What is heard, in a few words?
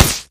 imp bullet energy titan; Titanfall 2